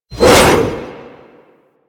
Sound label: Arch Wing Sword Whoosh; Warframe; Weapons